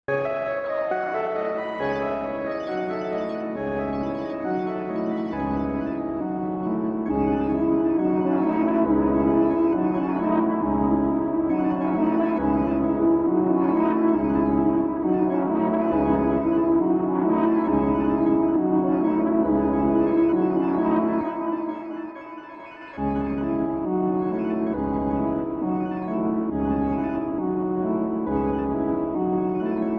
Hot 136 C minor dark

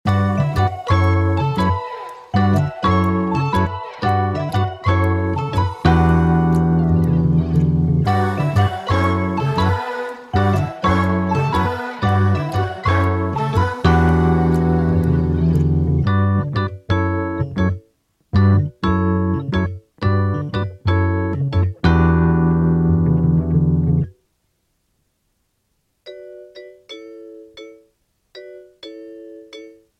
120bpm B minor

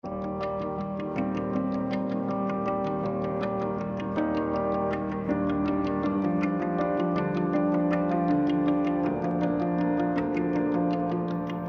Florence 160 G minor melodic sad

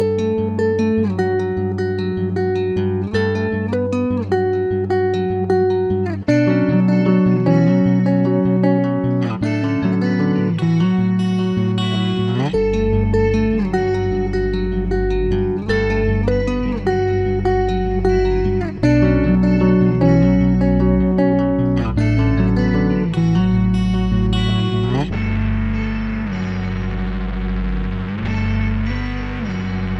153bpm B min

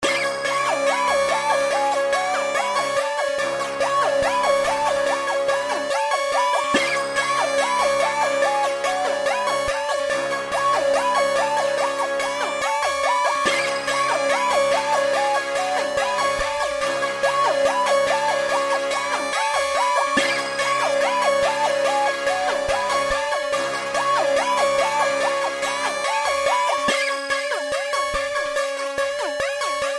C m atl drill 143

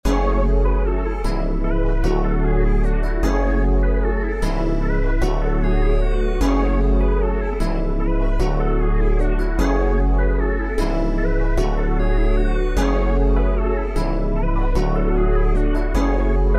151bpm F min

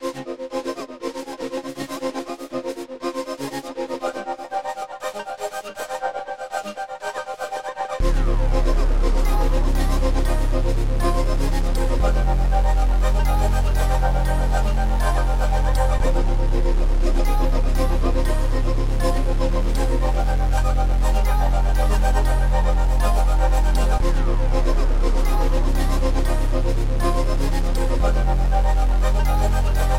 120bpm F min

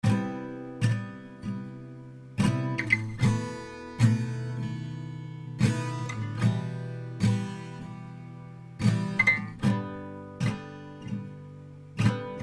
75 E minor spanish guitar

